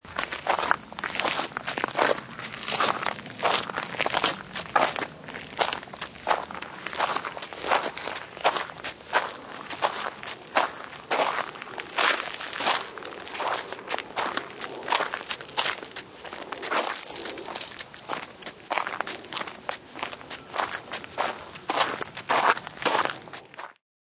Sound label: Walking on gravel.; Footsteps; Sound Effects:Human